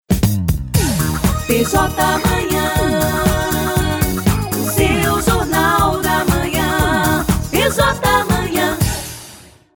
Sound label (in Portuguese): VINHETA EDUCAR FM; manhã